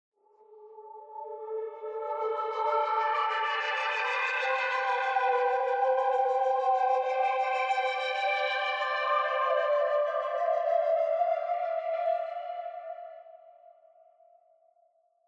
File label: trem